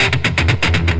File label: 239 black metal riff; GUITAR LOOPS